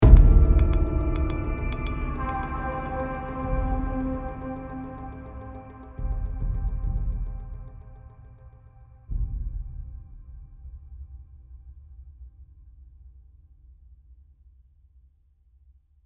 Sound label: Scifi Outro; Bravetrails Scifi Outro